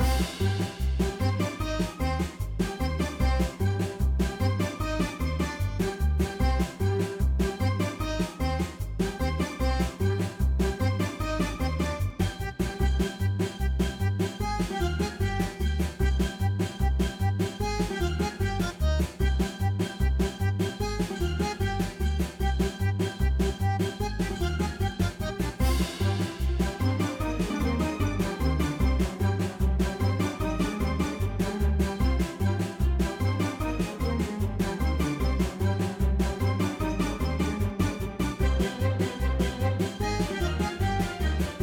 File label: Battle Music